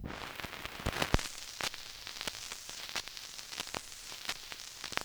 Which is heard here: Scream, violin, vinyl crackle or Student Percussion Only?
vinyl crackle